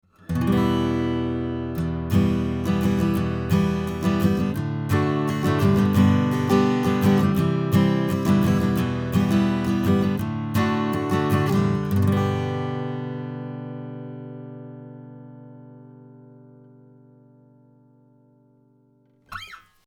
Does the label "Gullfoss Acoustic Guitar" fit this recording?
Yes